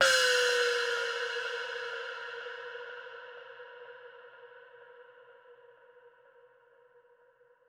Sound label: PERCUSSN ETHNO 125, Drum